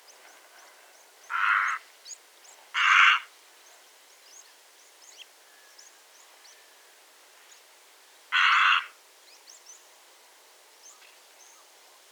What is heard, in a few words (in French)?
Aigrette garzette Egretta garzetta